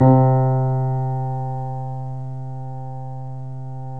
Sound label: 3D Boesendorfer; E-MU Producer Series Vol. 5; 3-D Audio Collection